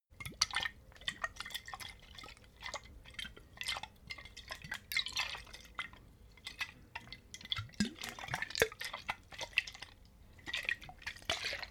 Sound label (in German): bottle vodka movement, gastronomie